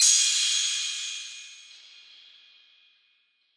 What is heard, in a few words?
Crashing open Hat, Hip Hop; drums; STYLE KITS (Hip Hop, Etc); Khezie Hip Hop Kit